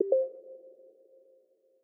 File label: update available; Celestial 2.1